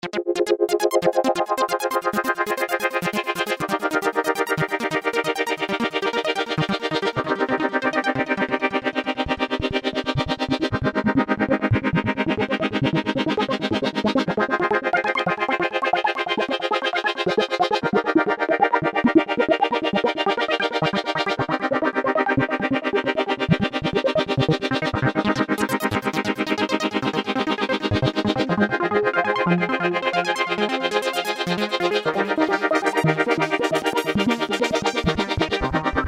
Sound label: ELECTRONICA DEMO, Korg microKORG; Factory Demo Songs